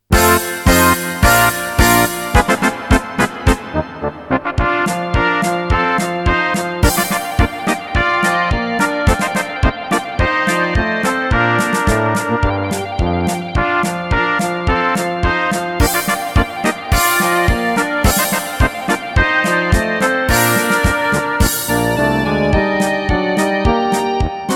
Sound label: polka